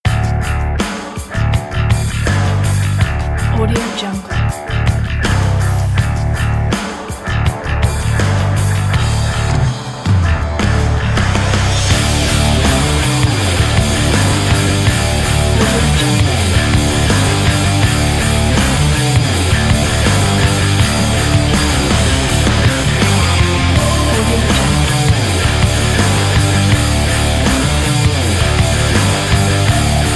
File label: old school alternative